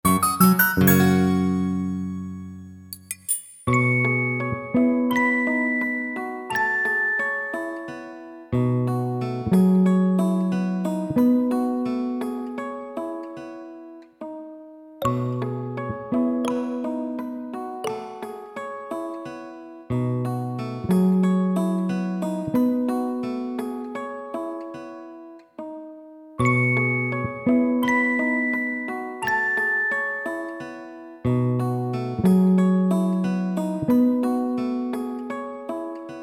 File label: Train Station